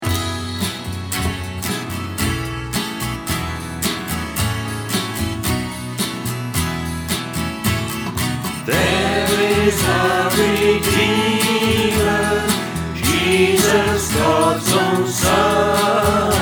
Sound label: choir